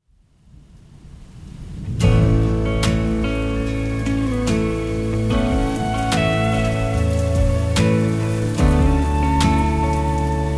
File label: Rain Guitar, solo music